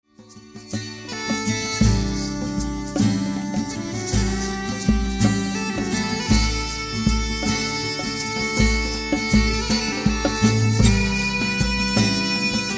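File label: pipe percussion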